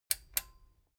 metal button stereo click enable slow

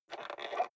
rotary control general creak

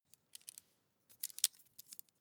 meter fold in single

